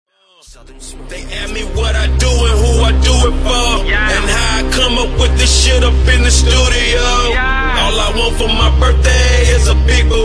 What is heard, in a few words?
birthday song